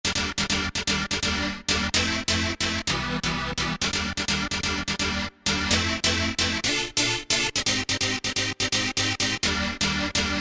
Fiesta, Roland SR-JV80-06 Dance

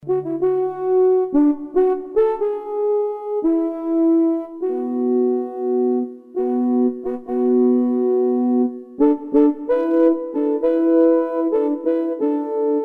Fr Horn 1; Roland MT-32

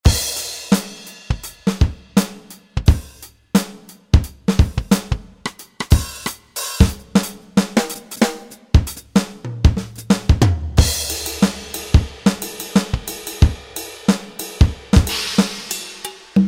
Dry Kit; Yamaha A4000; Standards

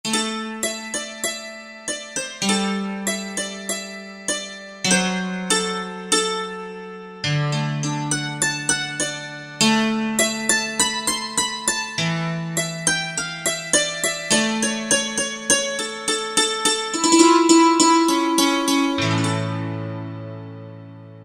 Brt. Dulcimer; World; Roland SR-JV80-05

